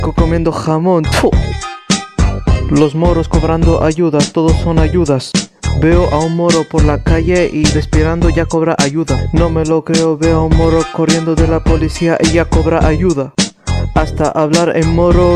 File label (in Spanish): rap